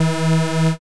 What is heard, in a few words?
wrong buzzer